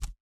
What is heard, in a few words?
UI skill tree next